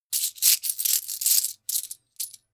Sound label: coins handling